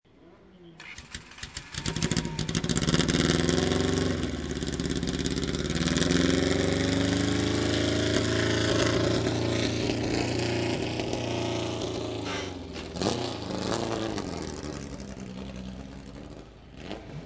rev

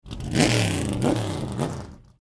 1965 Corvette 327

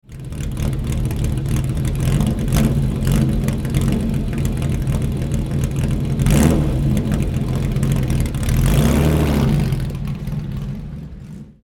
Ferrari Daytona

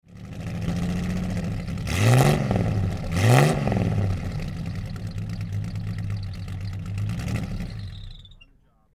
Alfa Romeo

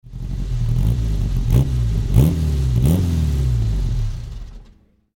Ferrari 750 Monza

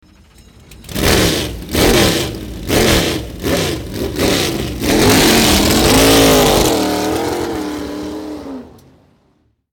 Lola T332, engine; 2003 Skope Classic; Ruapuna Raceway, Christchurch, New Zealand

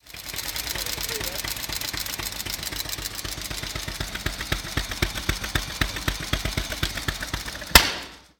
BSA

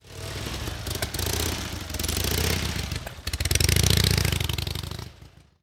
Indian Scout 600

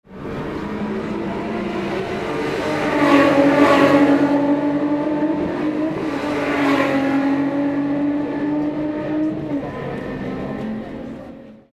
beetle

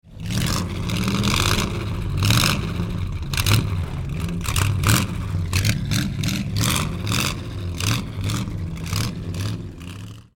Reuter V8; 1935 Reuter V8 Spl Old Gray Mare

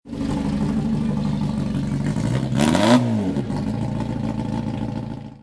mg td rev

